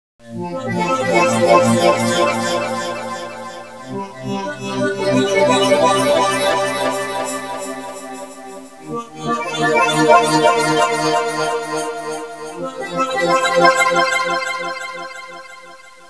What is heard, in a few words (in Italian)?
musica tecno; techno